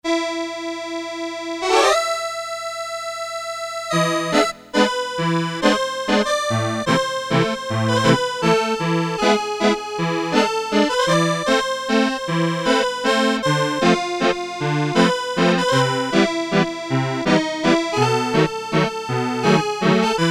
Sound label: Accordion, Casio CT-370; Tones